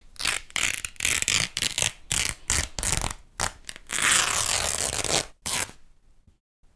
Ripping Clothes

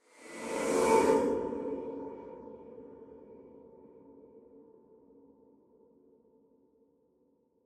Horror Creepy Resonance Swoosh

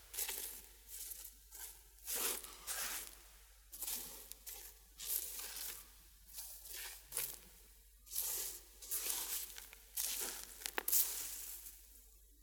Playing With Gravel And Dry Leaves And Sand

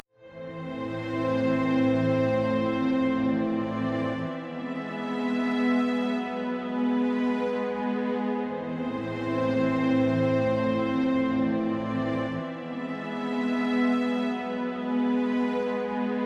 Strings Loop Am E

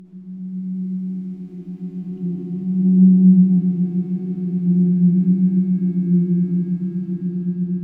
Scary Ambient Noise

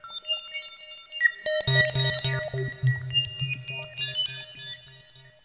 Space Blip

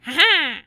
Heheh

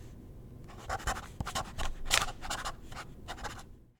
Pen Writing

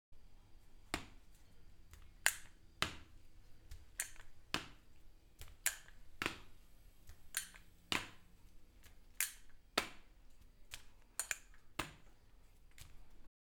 Walking With Crutches

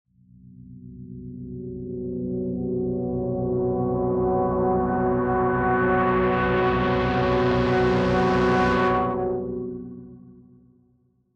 Long Tension Riser

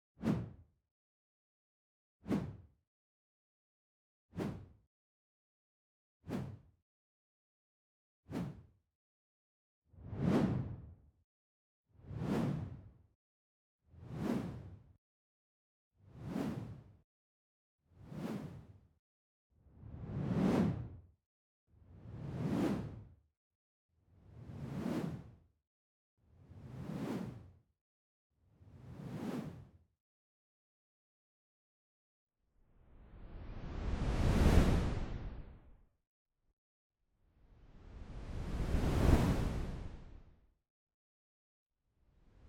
Swooshes Whoosh Short Deep

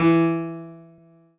Piano Ff

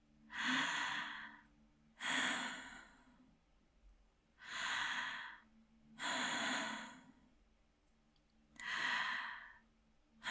Out Of Breath Woman Slow

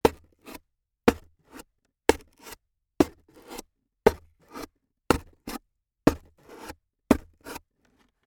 Stabbing Cardboard

